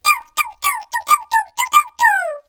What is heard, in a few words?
Creature; Orphaned; Kinect Joy Ride; Xbox 360